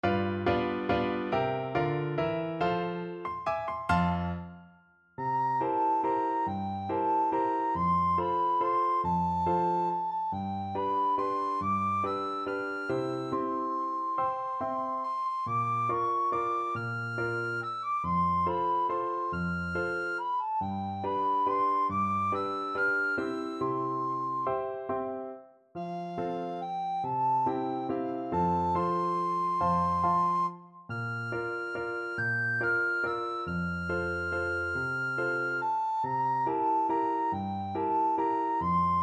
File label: recorder